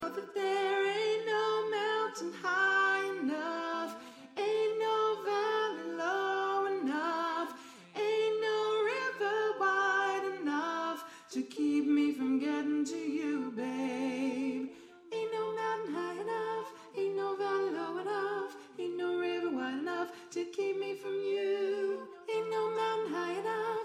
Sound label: lead